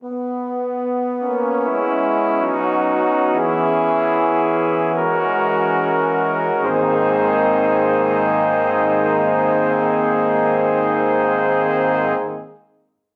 Phrase Regal Conclusion BMaj AbMaj 72 bpm, Brass Quartet; Ableton; Spitfire Audio